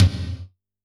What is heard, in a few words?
Tom, drum